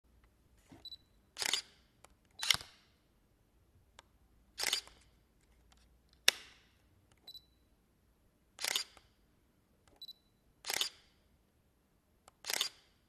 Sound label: Shutter